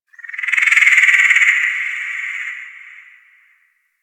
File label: Forest Distant Frog Call; Warframe; Grineer Forest